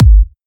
mid range kick one shot G sharp key

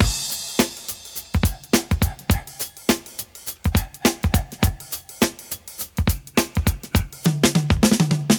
104 bpm drum loop g key

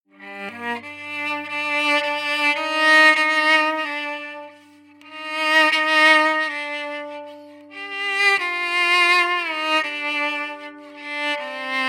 electric cello sad DI sequence

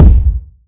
nice kick drum sound g sharp key; kick drum samples

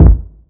rich low end kick drum sound a key; kick drum samples

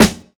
sparkly snare sound g sharp; snare drum samples

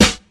studio steel snare drum sample c sharp key; Mono

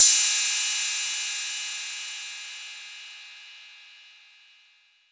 crash cymbal sound d sharp key; drum sample; single hit; one shot

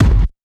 boom bass drum f sharp key; kick drum samples

